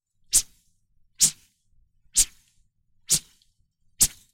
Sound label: Foley Passive Attack Drum Brush Swish; Xayah